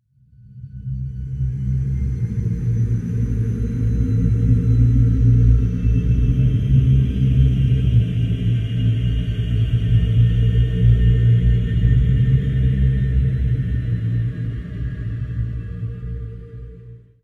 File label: Scary Ambience